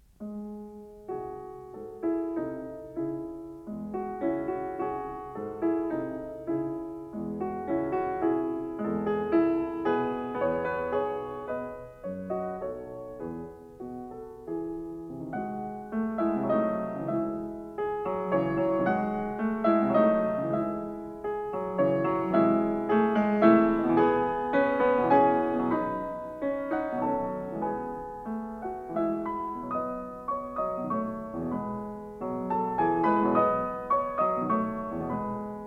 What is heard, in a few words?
Waltzes for piano, 4 hands (or piano), in D Minor; Brahms